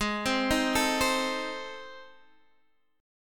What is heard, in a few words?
A Minor Major 7th Flat 5th